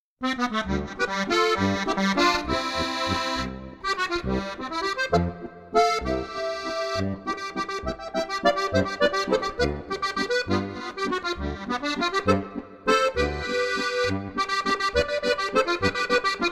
walzer

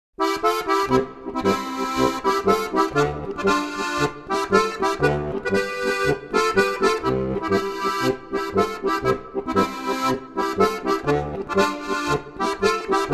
polka

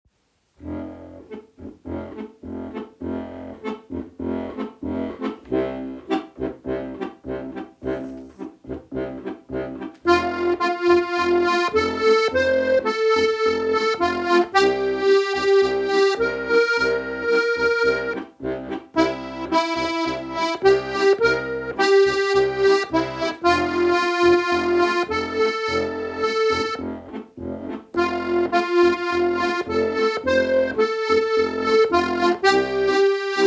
Samba, steirischen; Lele Verlag

